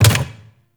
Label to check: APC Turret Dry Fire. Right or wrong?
Right